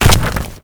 Colossus Hand; Warframe; Colossus Boss

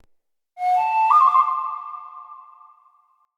Animal Flute Call; Player Instrument; Animal Summon

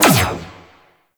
Laser Fire; Warframe; Teralyst; Vomvalyst

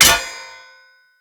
Short Sword Medium Impact Metal

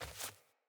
Sandals Walk Dirt; Player; Footsteps

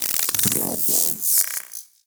Venus Robo Fish Small Caught; Warframe

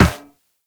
Run Metal Perforated, Footsteps; Warframe; Characters; Operator

